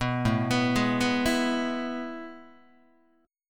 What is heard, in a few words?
A Major 7th Suspended 2nd, Guitar; AM7sus2 arpeggiated; JGuitar